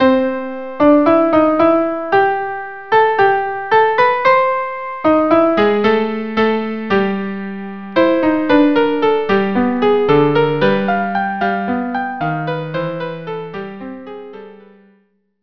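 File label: violin viola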